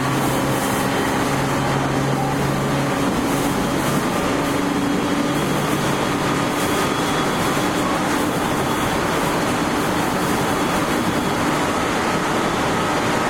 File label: 701 igbt mid top; tetsuon